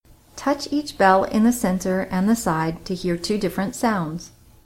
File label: chinese bronze bells intro try it; VMFA